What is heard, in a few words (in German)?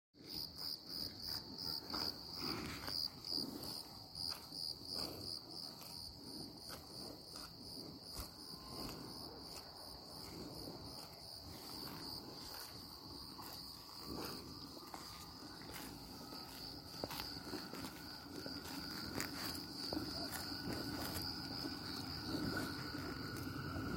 kuehe gras tram zirpen